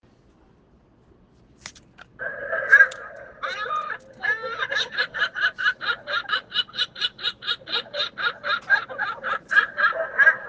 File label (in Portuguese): gaivotas rindo